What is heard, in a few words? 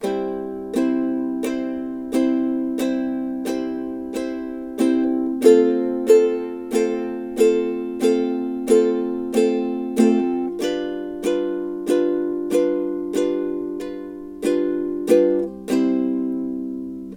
slow straight, ukulele